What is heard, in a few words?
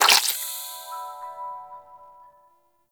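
Power Shroom Projectile Pulse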